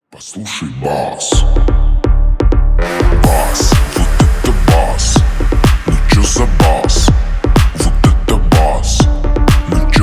bass

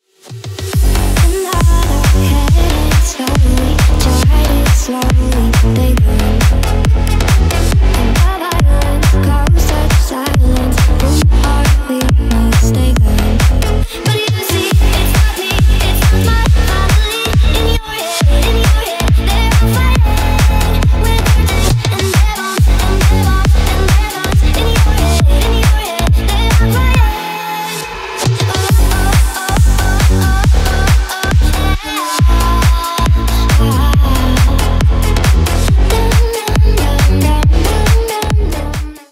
nightcore remix

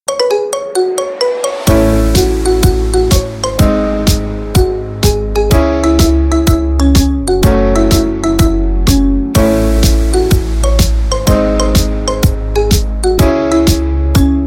marimba remix

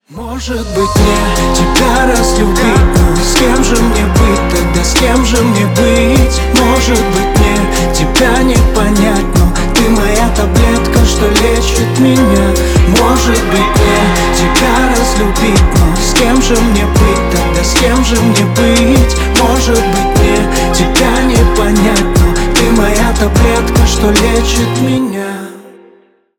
romantic version

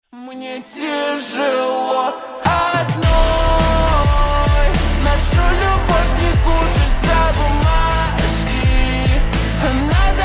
slowed reverb

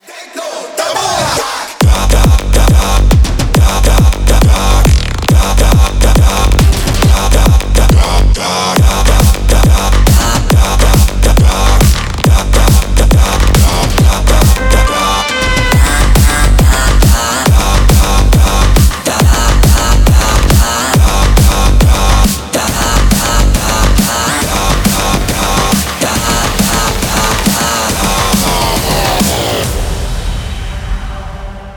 techno

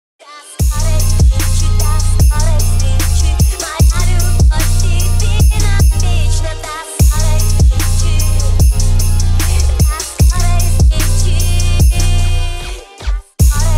phonk edition

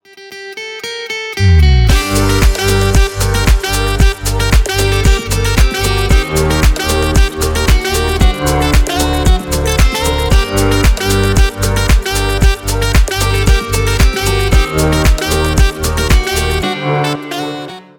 instrumental

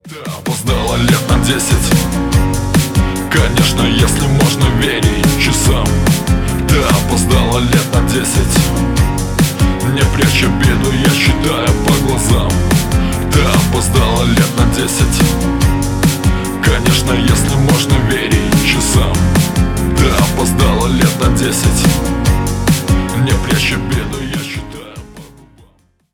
slowed reverb

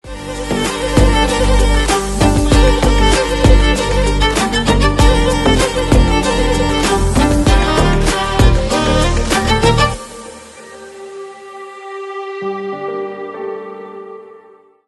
instrumental

